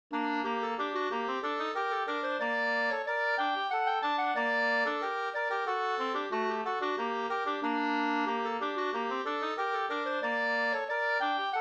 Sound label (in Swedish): polska senpolska; Havero Mp; Anders Hallstrom